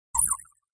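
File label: Electric Drips Reverb, multimedia element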